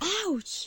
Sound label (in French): melodie; code game jam drop plafond 2025